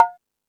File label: Beat Box; E-MU Pro-Cussion